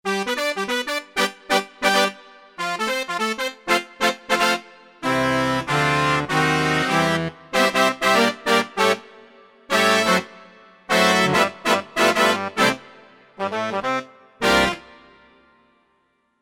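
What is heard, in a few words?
brass; E-mu Proteus FX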